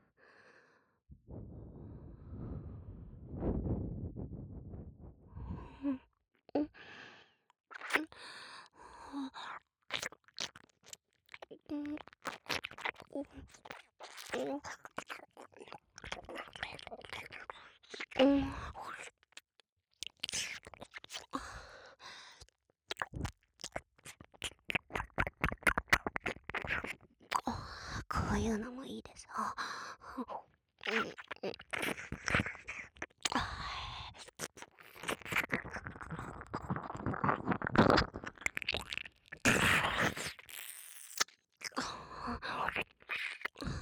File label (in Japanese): 耳舐め, ASMR; アドリブ集; 生意気神待ちギャルJKの強制中出し同棲性活～わからせオナホ化計画～